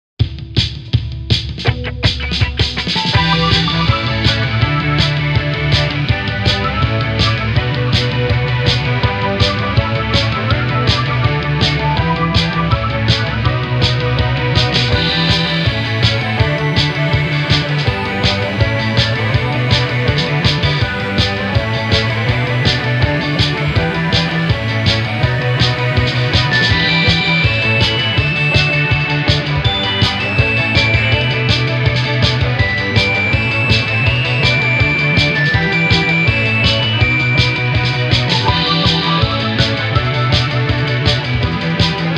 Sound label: Inst